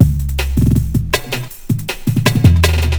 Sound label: break